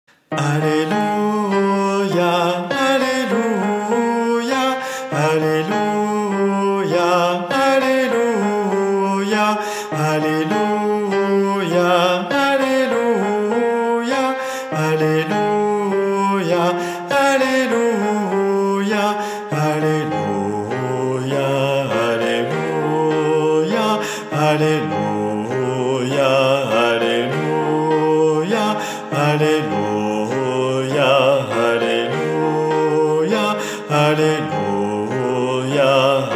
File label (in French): Guide voix Basses; Missa Pro Europa - Alleluia !